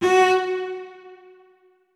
notes, keyboard; Hideout Furniture

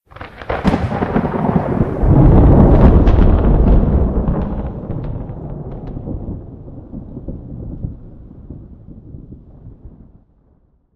very close, nature; Atmospherics

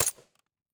dmr, weapons bolt; JSRS Sound Mod

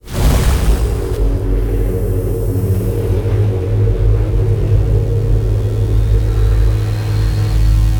boost, exo; Powered Exo-Skeletons

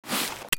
hand, item usage; FDDA

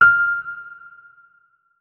notes, keyboard, electric piano

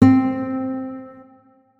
notes, guitar; Hideout Furniture

